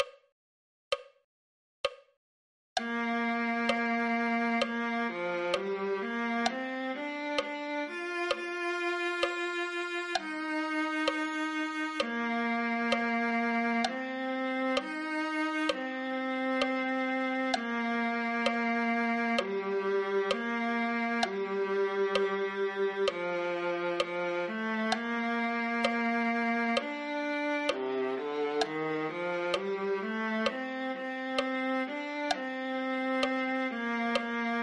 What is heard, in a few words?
viola